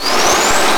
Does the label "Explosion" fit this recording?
Yes